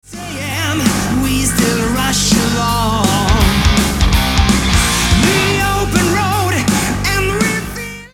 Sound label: Breakdown